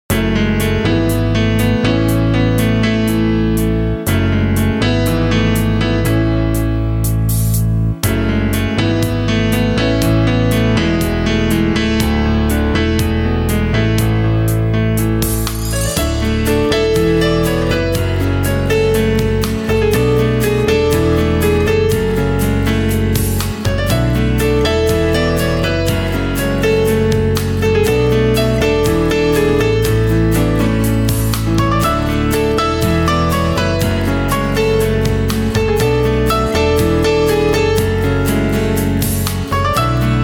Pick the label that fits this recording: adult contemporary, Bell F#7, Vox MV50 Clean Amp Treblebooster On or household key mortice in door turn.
adult contemporary